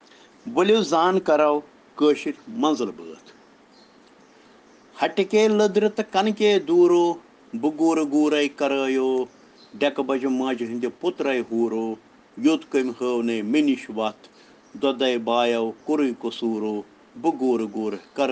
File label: Children Rhyms in Kashmiri